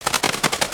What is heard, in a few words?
wing flap loop long loud; creatures organic